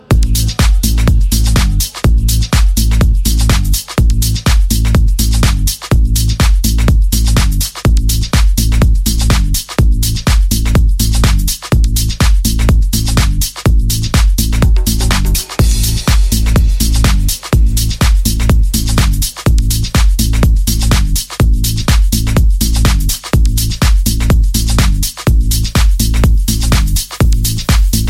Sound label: Electro Dance Mix Ringtone